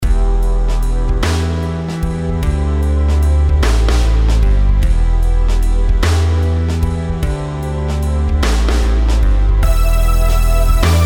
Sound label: rhythms compound 8s